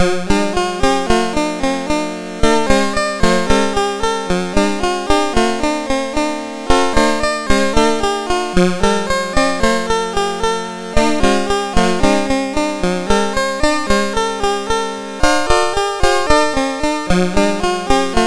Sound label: Willow Pattern, MOS6581R2